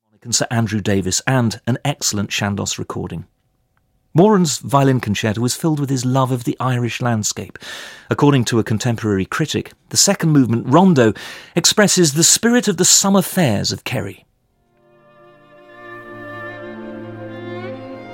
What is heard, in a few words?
concerto for violin and orchestra 2nd movement rondo vivace; ej moeran